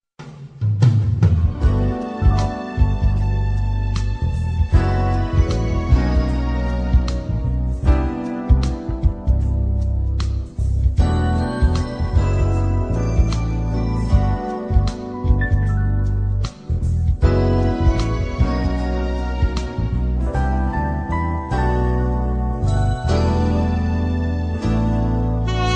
saxophone

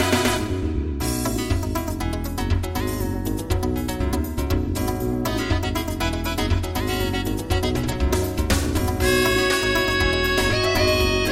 midi

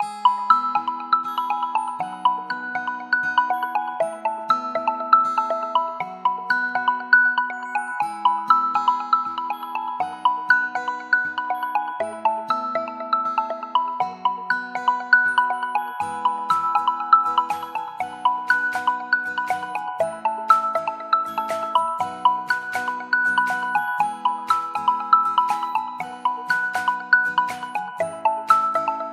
Xiaomi Mi Glass Chime

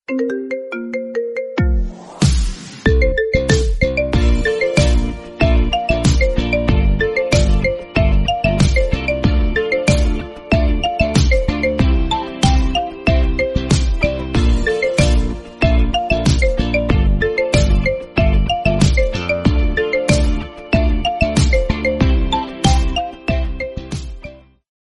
Pied Piper Marimba

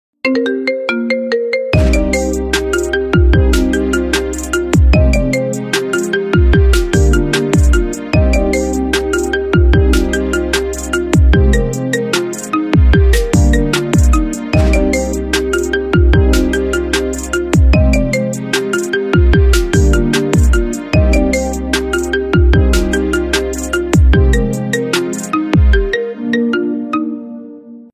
Sad Marimba Remix

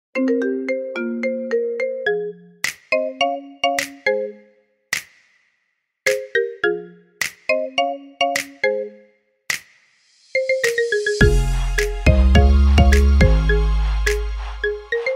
iPhone Marimba

